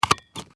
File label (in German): office locher